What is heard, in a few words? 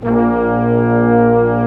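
Section, Brass Large Ensemble long; Roland LCDP06 Brass Sections; Lrg.Ensemble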